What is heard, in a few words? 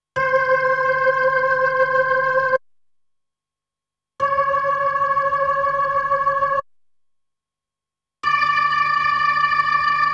B3 Organ; Masterbits CLIMAX 8